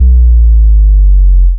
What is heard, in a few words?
Sub, drum